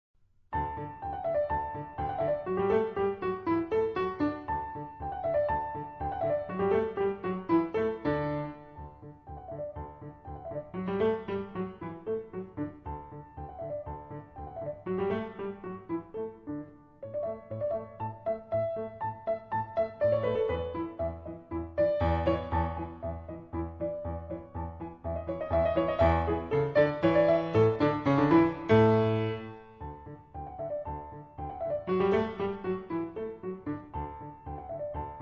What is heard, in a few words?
Musette D major